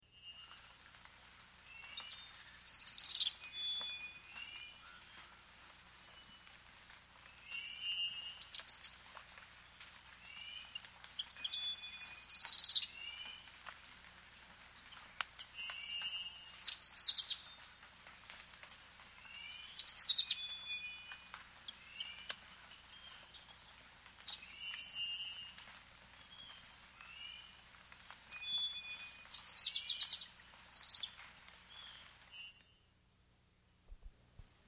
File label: Common Bush-Tanager, Nature's Songs, S. America